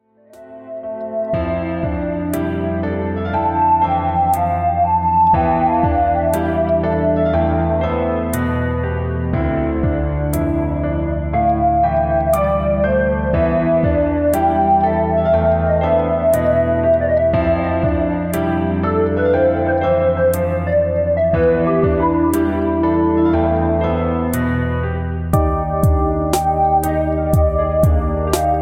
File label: piano; cat